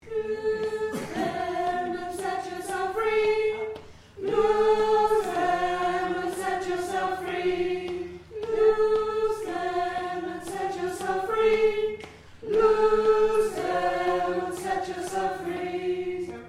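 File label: soprano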